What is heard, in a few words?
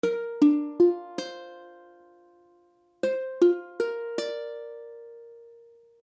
Bbadd9, akordy ukulele